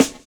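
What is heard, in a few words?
snare INTRO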